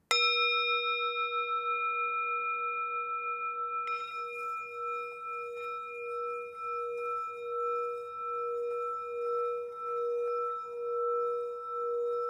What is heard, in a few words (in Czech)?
tibetska misa; Tibetská mísa Gyal malá; palička na hraní; Obrácený zvon, meditační mísa, léčivá mísa, himalájská zpívající mísa, tibetská mísa